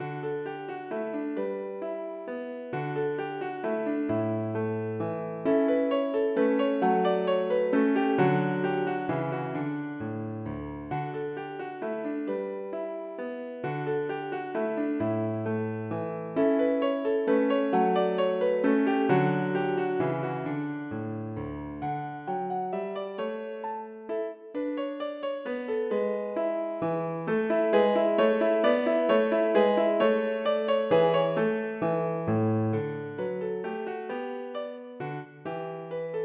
Menuet for piano

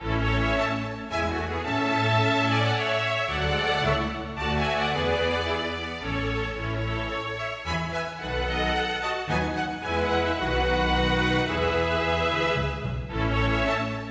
Menuet for strykekvintett, u trommer; med ad lib trommer

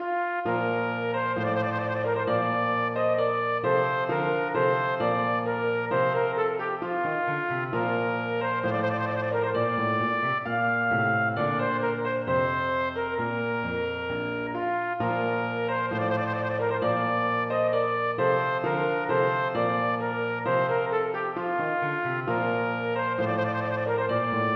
Marsch for trompet og piano; Aamodt

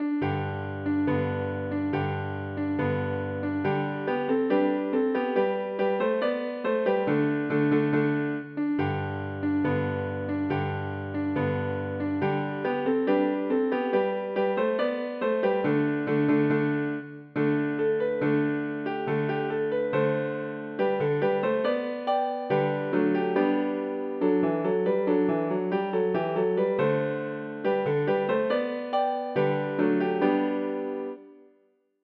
Allemand for piano; Bang

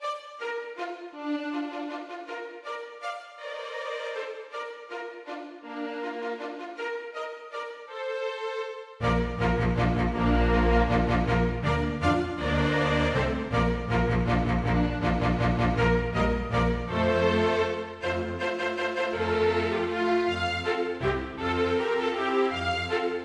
Menuet for strykekvintett; Aamodt

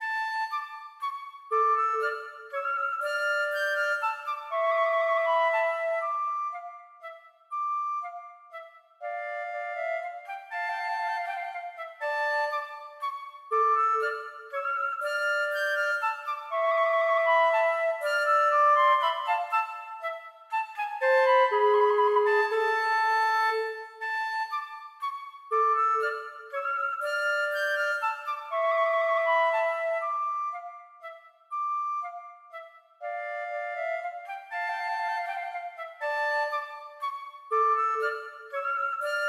Menuet for fløyte duett; Aamodt

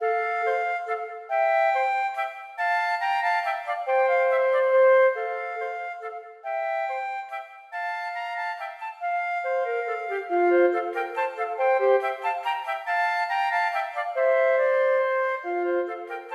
Menuet for fløyte duett; Aamodt

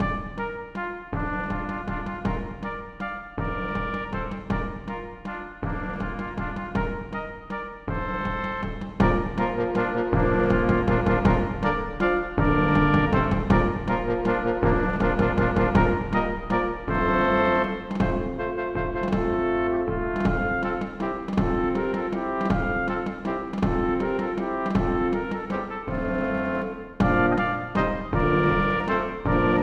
Menuet for messingkvintett (ad lib trommer); Aamodt

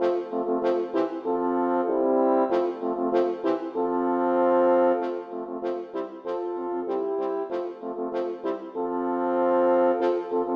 For fire horn

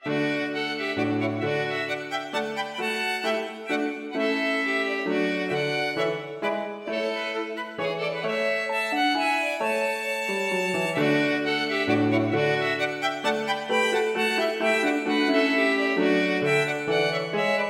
Menuet for to fioliner og piano

